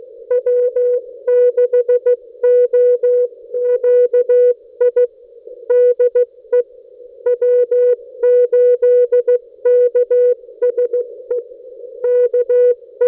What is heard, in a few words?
80m, arrl